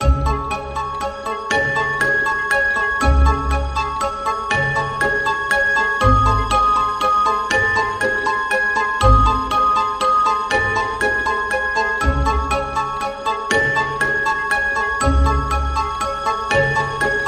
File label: Jingle Jingle Playful Atmos